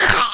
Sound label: fly cough